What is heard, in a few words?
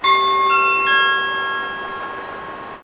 bing bong